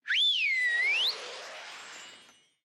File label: character request; Dorothy And The Wizard Of Oz - Cookie Magic